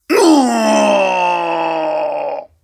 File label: battle death; Zote